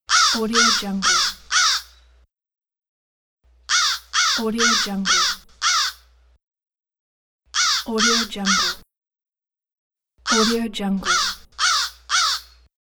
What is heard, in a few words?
crow screams